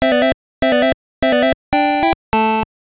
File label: Jingle, gb; Master Karateka